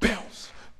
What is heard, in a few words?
Bounce, Vox